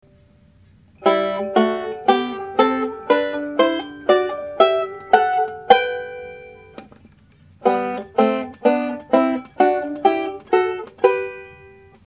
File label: pick blocking, banjo